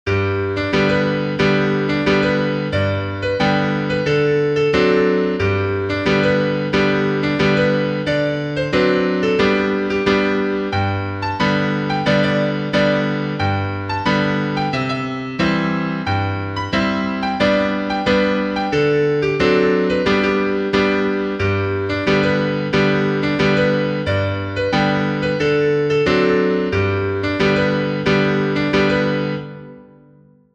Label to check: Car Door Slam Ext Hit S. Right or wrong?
Wrong